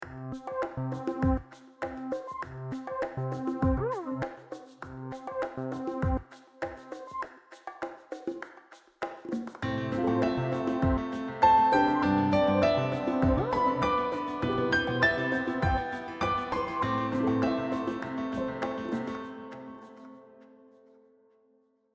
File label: softpiano